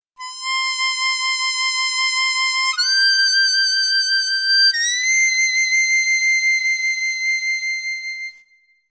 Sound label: Effects, harmonica